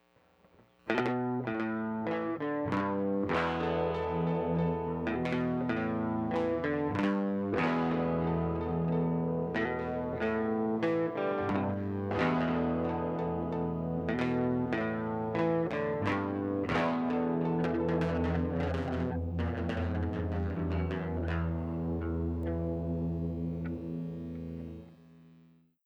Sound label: riff